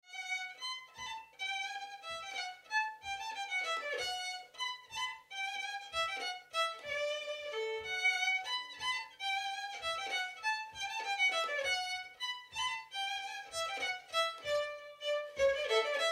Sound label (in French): Bourrée